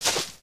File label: grass, human step